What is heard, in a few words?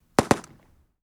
gun usgi m3 grease gun pov